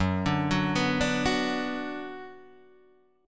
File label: F sharp Suspended 4th Sharp 5th F sharp Guitar Open D